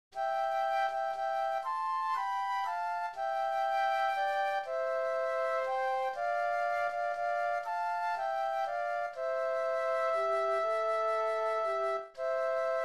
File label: Flute Duet